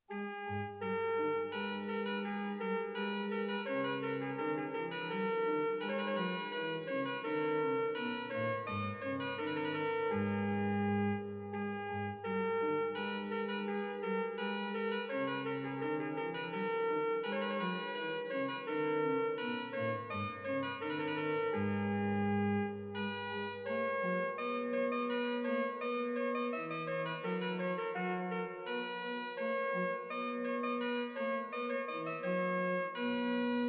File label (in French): orgue; Reducciones